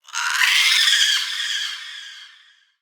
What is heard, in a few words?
Settlement Creature High Mid; Warframe; Grineer Settlement